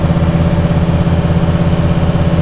MOTOR, Slot Car

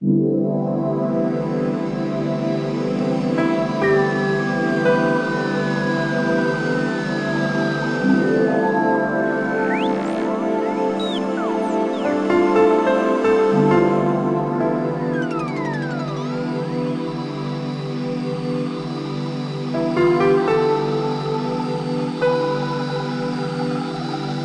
relaxed; cdr presenter lite

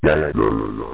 ugg

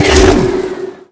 Chain Gun Stop; Phoenix

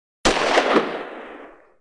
GUN, crime; zones

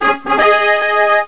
victory; Dirty Dozen 1.0

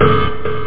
synth; BeatBox; BeatSounds

